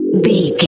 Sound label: retro misc bass sound